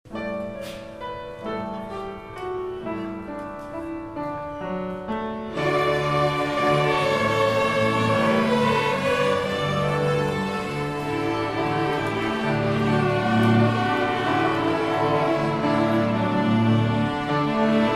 French Folk Song, Orchestra; Spring Concert 2013

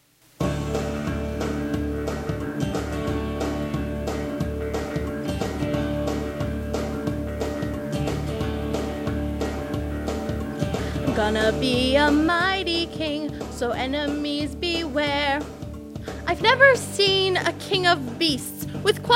Just Cant Wait Vocals

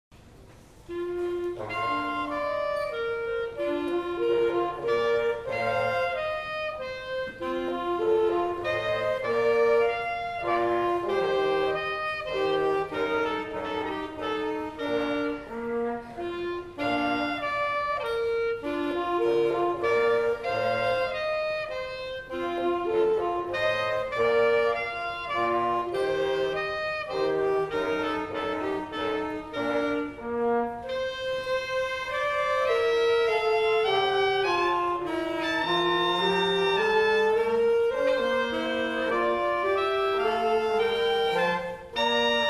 Minuet Quartet, Band, Concert Performances; Spring Concert 2013

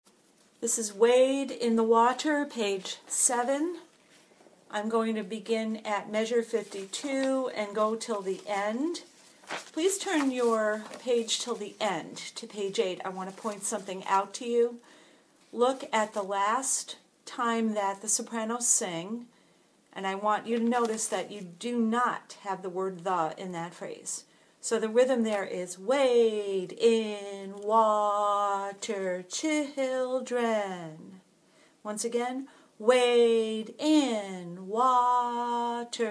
Soprano; Special Chorus

